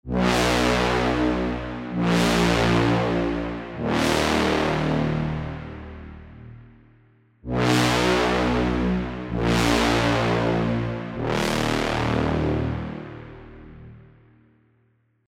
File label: Melodic Brass; revealed halloween horror 2025 edition